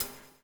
BUBBLE; Spectrasonics - Backbeat

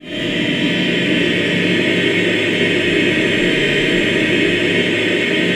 clusters, voices

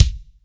MG KICK, kick

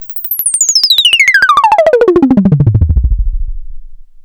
OSC FX, OSCILLATOR FX; Zero-G Ambient 1

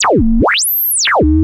Osc vs Ring Mod, vintage oscillator; radiosonics

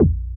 bass stabs, Drum Machines FutureRetro FR777

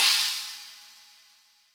China, Cymbal, Yamaha DTXpress

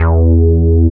BASS, Tekno Industrial; East West

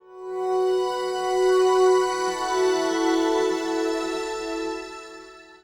Acoustic Drone, Long Loops; Sonic Foundry (Sony Creative Software) - Friendly Fire; DroneLoop

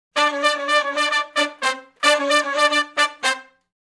Funk Riff (C) unison, Horncraft for R&B; Sections

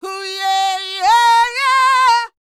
D YEAH, Vocal R&B Dance; DB DANCE

